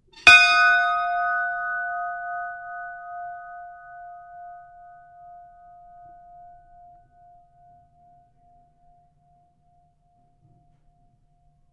SHIPS BELL RING; Hmas Castlemaine 1942; Various ships bells; Boat Sound Effects; Boats (Sound Effects:Vehicles)

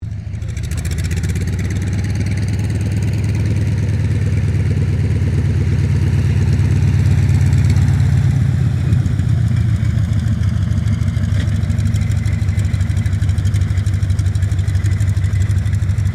AIRCRAFT CA-28 CERES TAXI; Airplanes (Sound Effects:Vehicles)

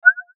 Beep Tone Signal Multimedia; Web Interactive; Beeps (Sound Effects:Multimedia); Blastwave; Technology

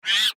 Monkey Screech Single; Animal; Blastwave

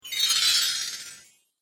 Christmas Spell, Magic Sound Effects

